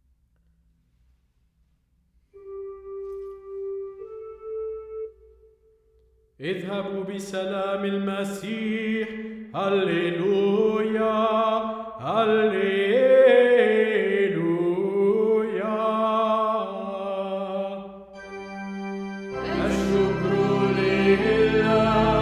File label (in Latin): Ite Missa est Alleluia; Saturday; اذهبوا بسلام المسيح الزمن الفصحي